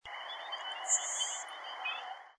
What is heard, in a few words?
lecontes sparrow